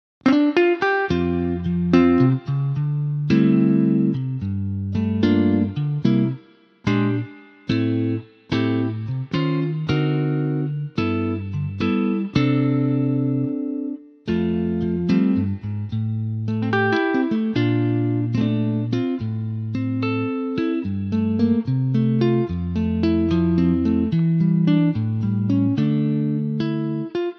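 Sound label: F Elec Gtr, backing tracks for male vocals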